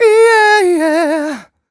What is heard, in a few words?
128 uh e-flat, mal, Vocals